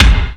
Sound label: KICK, SDX KICKS; Greytsounds VOL-1